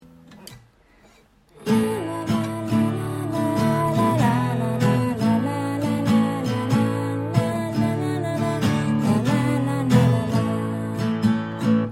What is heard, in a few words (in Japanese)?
ギター に メロディ